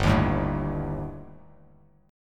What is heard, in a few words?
G Suspended 2nd Sharp 5th, Bass Standard; Gsus2#5 strummed; JGuitar